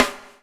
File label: BUBL, BUBBLE; Spectrasonics - Backbeat